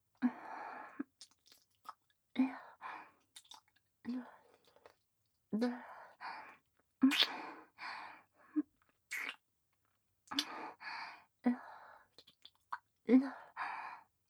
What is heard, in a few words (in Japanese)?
フェラ 普通